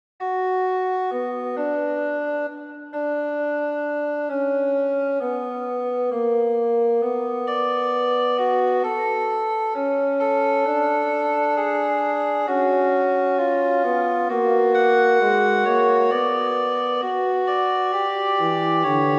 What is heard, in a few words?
fugue in b minor